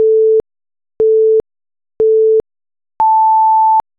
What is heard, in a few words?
sine 22050